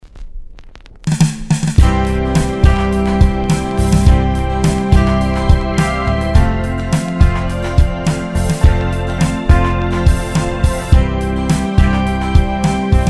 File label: poprock